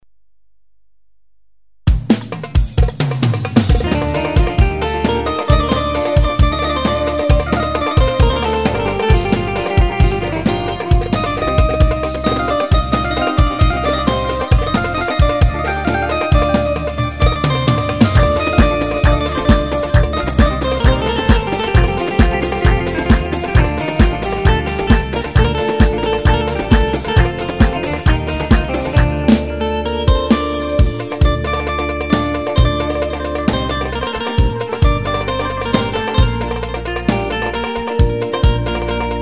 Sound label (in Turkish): sebare ve lesli elektro; sx3000